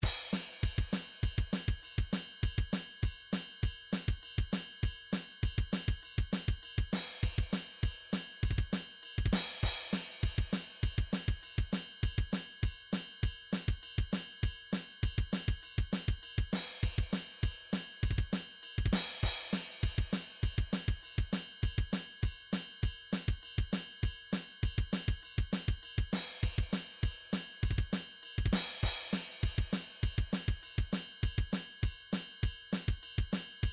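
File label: midi drums hard rock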